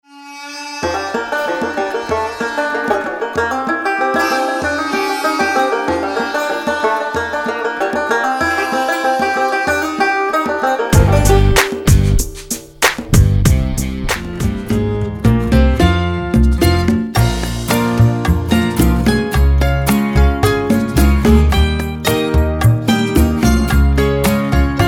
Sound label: Acoustic Folk Country mix